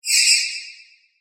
Computer Screen, Scifi, Computer, Screen, Readout, Bright, Shimmer, Science, Fiction; SoundEffects-BlastWave